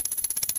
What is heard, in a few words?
output appear, terminal